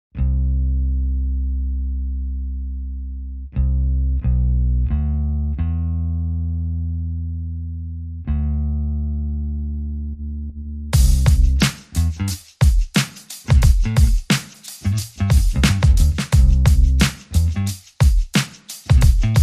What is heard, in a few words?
Drums and Bass Only